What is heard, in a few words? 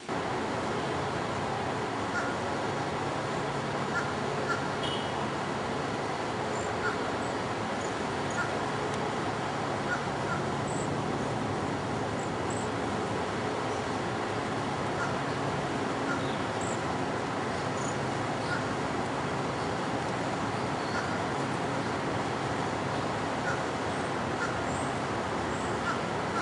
cedar waxwing